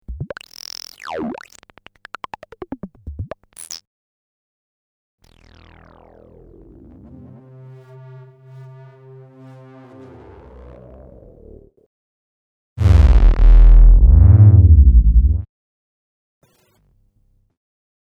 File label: Kaossilator